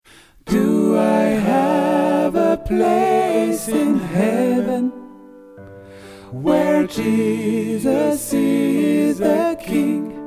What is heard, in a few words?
Tutti, Gospel